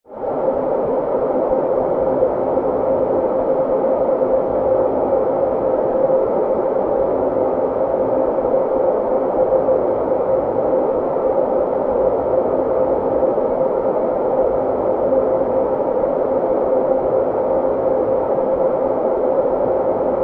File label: Ambience Scifi Wind